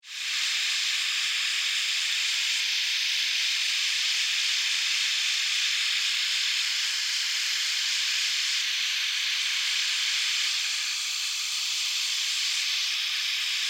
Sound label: Alien Jungle Insects, Scifi, Alien, Jungle, Insects, Creatures, Science, Fiction